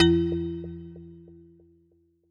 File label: pm chat